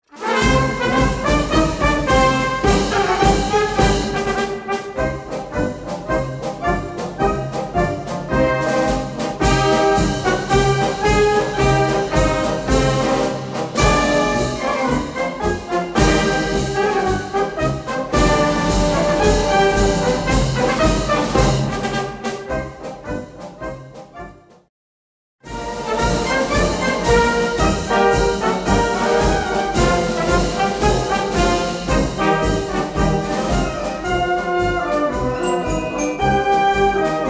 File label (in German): Marsch